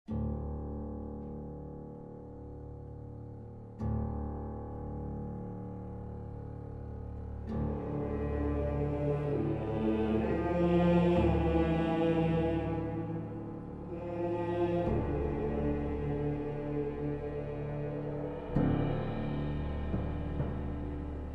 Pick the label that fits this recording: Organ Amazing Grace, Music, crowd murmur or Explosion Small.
Music